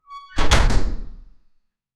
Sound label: futuristic door sliding